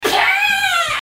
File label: sound attack; alvamajohaters juego